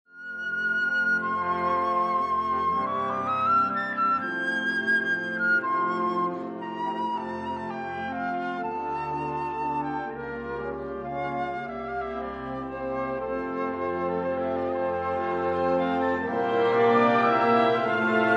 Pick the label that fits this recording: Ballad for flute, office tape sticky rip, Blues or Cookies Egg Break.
Ballad for flute